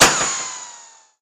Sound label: gun tail; Weapon-Explosion